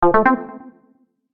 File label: Infographic call answer notification